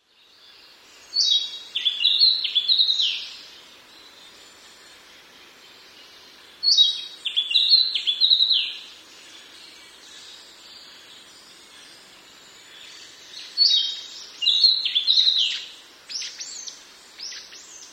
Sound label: kibitaki; キビタキ; 2014年7月6日 長野県茅野市